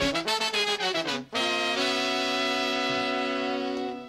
horns, phonky loops

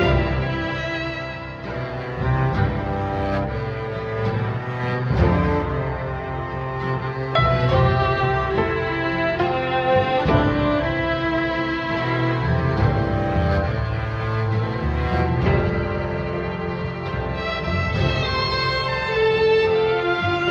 strings, Loops, Sad

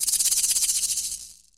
rattlesnake, Misc Percussion

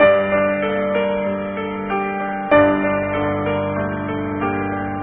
piano, Loops, Sad

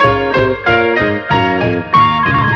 keys, Phonky

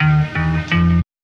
rewinder, stabs

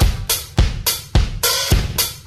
just add a snare, drum loops

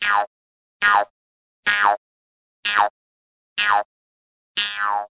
jumps

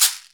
Shaker

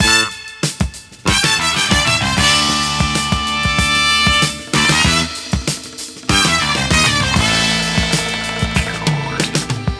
full, Loops Phonky

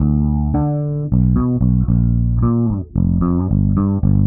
bass, Loops Phonky

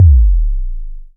aggressive, Kicks, Heartbeats

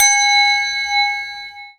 Bells

